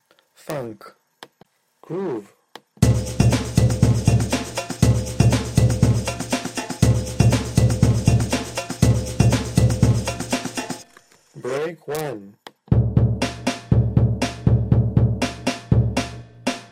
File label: tune Funk